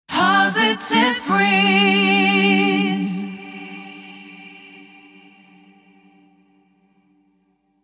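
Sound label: Sung Jingle, 105bpm G major, Acapella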